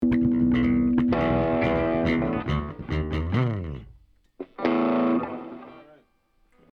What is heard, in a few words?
Loud Mix